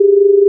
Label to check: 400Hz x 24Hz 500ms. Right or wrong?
Right